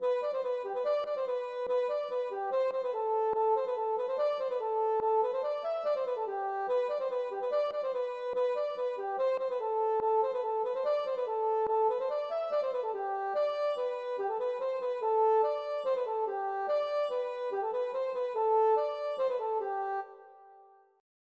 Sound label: polka